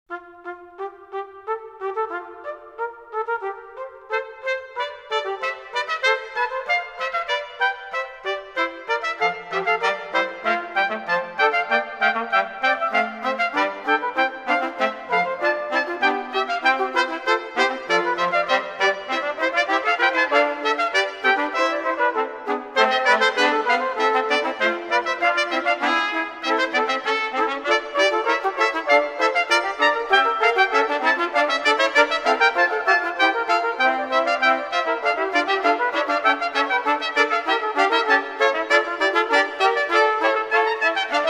Fuga; Fuga 1 Pequena Fuga

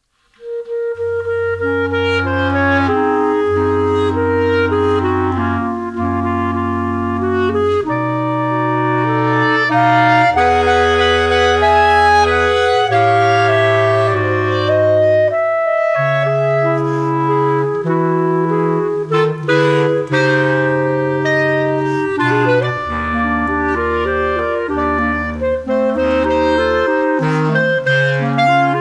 Clarone; Que musica e essa

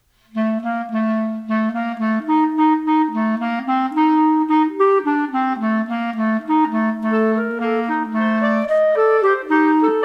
para 4 Clarinetas; Hipofrigio Plaga Deuteri

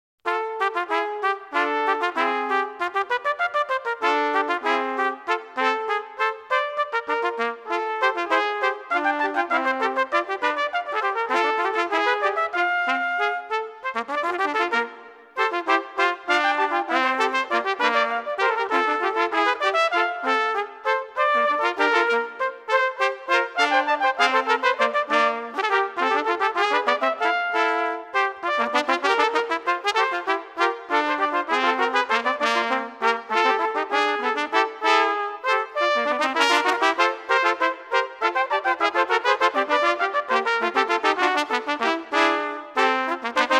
Peca para 3 Trompetes Trio para trompetes

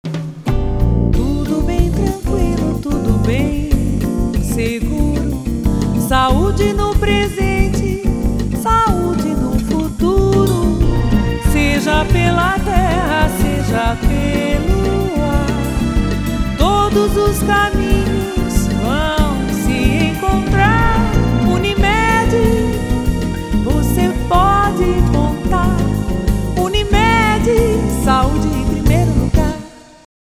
Bossa; Unimed

